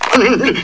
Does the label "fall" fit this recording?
Yes